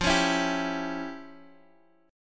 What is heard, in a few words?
E Minor 6th F; Em6/F Chord {X X 3 6 5 3} strummed